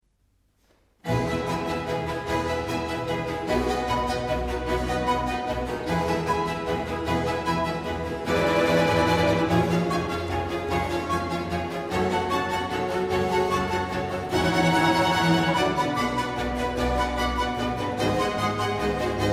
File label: Concerto for Flute, Strings & Basso Continuo in G minor, Presto; Op.10 No.2 La Notte; 비발디